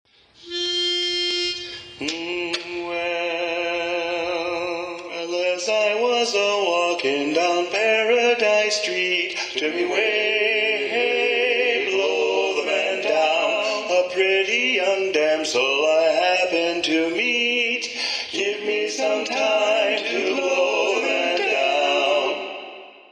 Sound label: Blow the Man Down All parts, sea shanties